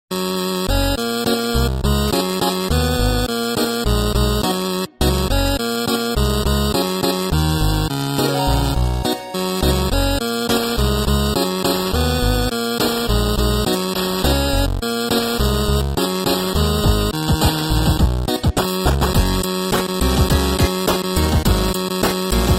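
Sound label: 8-bit music for mobile phone ringtones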